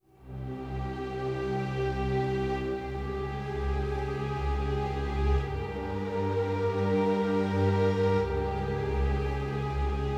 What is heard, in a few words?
Music Story Beats Mysterious